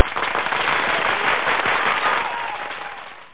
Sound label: Clapping; Bible Fun Pack